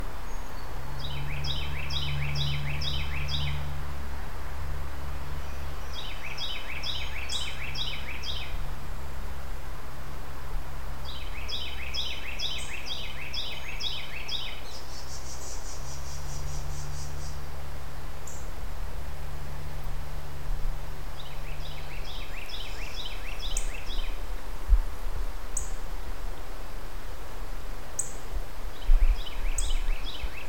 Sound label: Carolina Wren Thryothorus ludovicianus ludovicianus